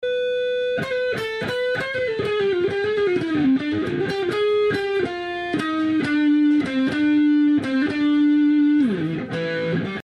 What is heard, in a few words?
Guitar Loop